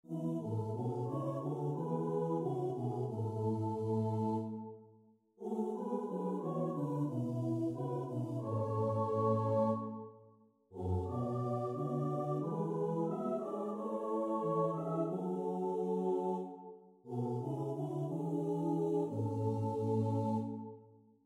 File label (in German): Chor klein; Di strass won i drann wone